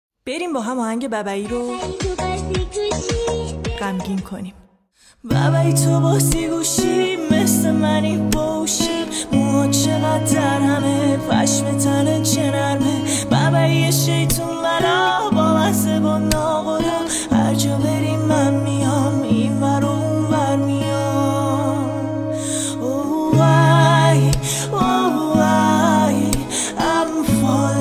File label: Guitar